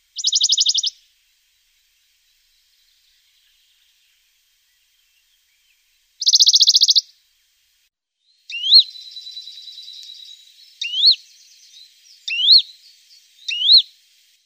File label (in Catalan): mosquiter, Cants; Mosquiter pàl·lid (Phylloscopus bonelli); ocells